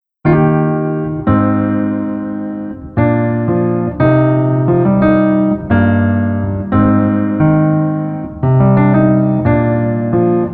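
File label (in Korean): Piano version 멜로디 MR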